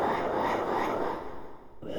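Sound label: Music dpad Black Hole 120; from dust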